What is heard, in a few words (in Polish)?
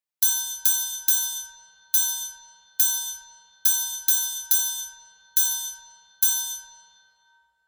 trojkat; Nagrania Lokomotywy